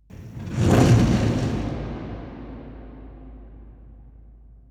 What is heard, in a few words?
ambient fireball flyby; Portal 2